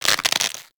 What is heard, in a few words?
Grapple Pull Back; Weapons